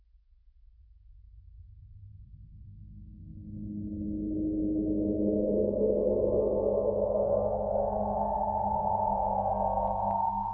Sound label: Subsonic Wave